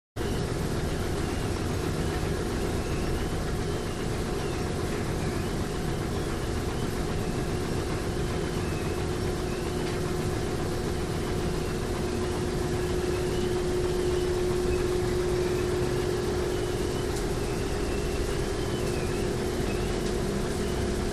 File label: Ski Chair Lift 1 Motor, Ski Lift Motor; Motor Constant With Squeaking.